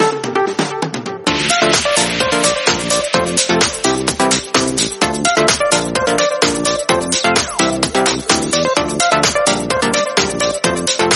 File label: Beach Dance